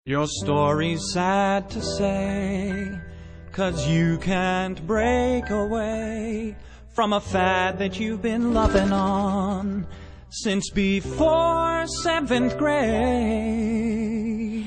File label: Sad Story